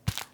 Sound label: Panel Burger Lettuce Set; Among Us